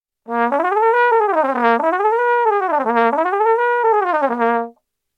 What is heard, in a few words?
gliss